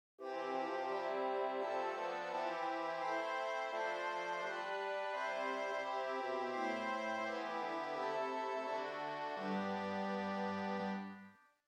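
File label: Swell Viola 8 + Celeste 8, organ; Swell: Gamba 8', Voix Celeste 8'; St. Anne; Hauptwerk; St Annes Moseley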